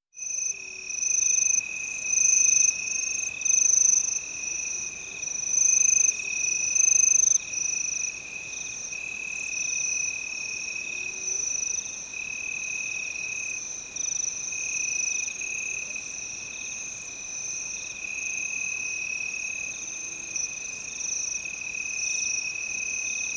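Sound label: thick atmosphere of night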